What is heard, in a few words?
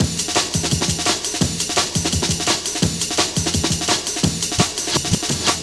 Dope Break, Drum & Bass; Fluid Dynamics